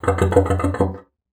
BASSOON; Xsample VOL-6

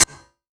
Yamaha CS1x Drums

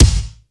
MONDO KICK; Crypton - DrumBase5000; METALIC DRM2

